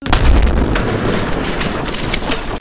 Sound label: boom boom bakudan; midori-school